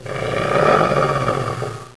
gonome idle; svencoop

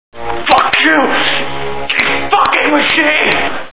machine

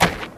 dirt; svencoop

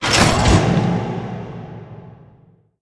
button; svencoop

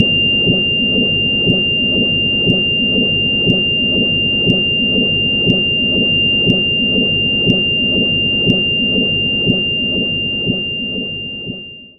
ear ringing; svencoop

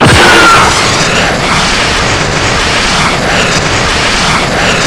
rocket, weapons

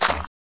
walk footstep; gearbox; demoman

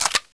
awp bolt, weapons; svencoop

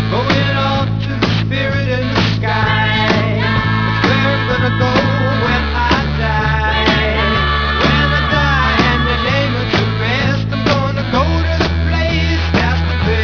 die

